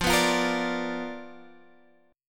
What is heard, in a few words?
B Minor Major 7th Flat 5th, chord